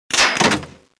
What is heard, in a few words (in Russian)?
vystrel i padenie